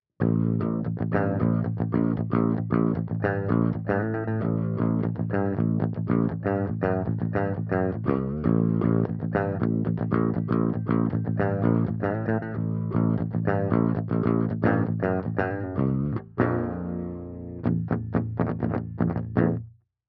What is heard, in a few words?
7 string riffing